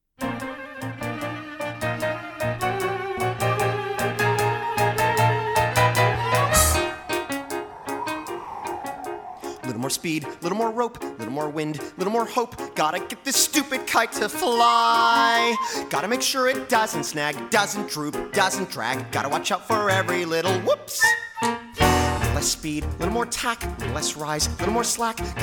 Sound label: Low Register (Baritones Basses); Iceberg lounge